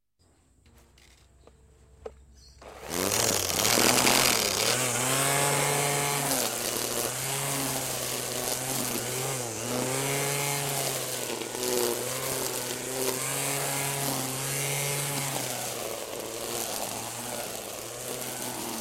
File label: Electric Weed Whacker